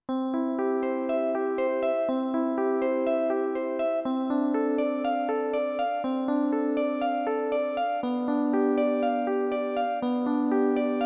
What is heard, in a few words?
El Piano 2; XG